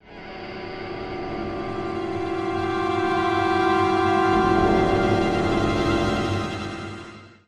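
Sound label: sting, string swell; transformers war for cybertron gamerip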